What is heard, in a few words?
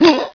zombie idle; zombie soldier